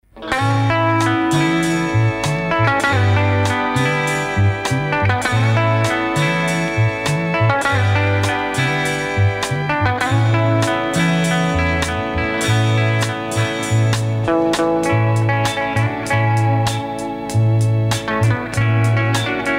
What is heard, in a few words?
sad guitar; Chilean